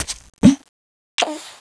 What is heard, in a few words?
heavy reload